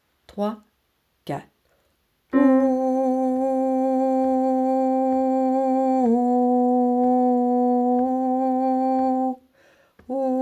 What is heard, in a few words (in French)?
Wellerman couplet refrain sopranes